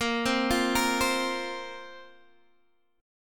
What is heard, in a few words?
A sharp Suspended 2nd A sharp down Guitar DADGAD